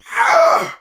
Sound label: Pain; Warframe; Tau; Dax; Ashigaru